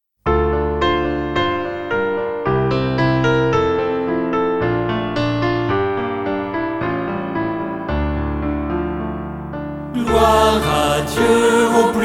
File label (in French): Gloire à Dieu; messe du Serviteur